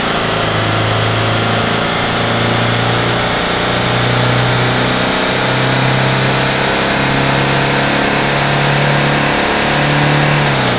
third, vehicles; gmod; tdmcars; gtav; camper

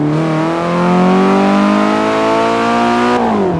second, vehicles; coopers

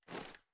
ads up, weapons; gmod; viper

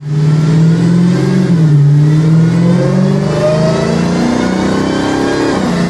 first, vehicles

